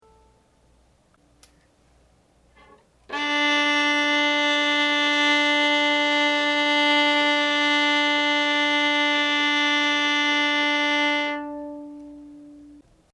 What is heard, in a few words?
Tune Viola D; tuneguide